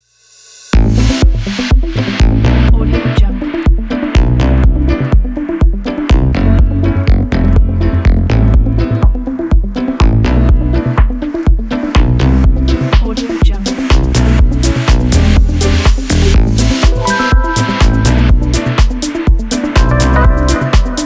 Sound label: glamour fashion